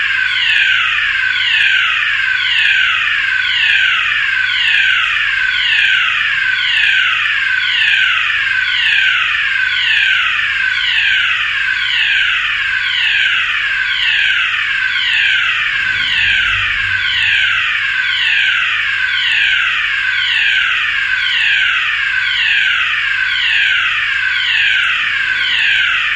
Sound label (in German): Sirene